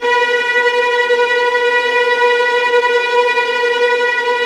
VIOLINT C#, Synclavier Strings; ILIO

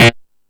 Killer Synth, E-MU Pro-Cussion; E-MU Pro-Cussion-1

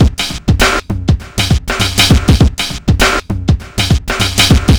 shufflin beat reggae; Sonic Foundry (Sony Creative Software); Ugly Remnants VOL-2

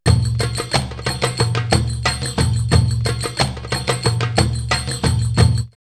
Telly Dance 90, drumloops World Ethnic; X-Static Goldmine

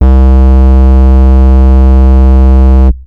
See Saw E, Basses; Total Drum&Bass

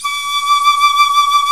PICL; Roland - LA Composer vol. 1; WOODWINDS

